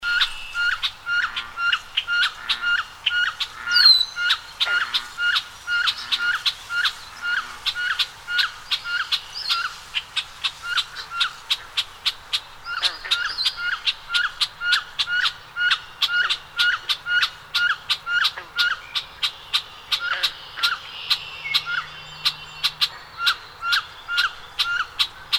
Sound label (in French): Canard branchu Aix sponsa, chants des oiseaux